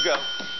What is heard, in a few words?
clang; usa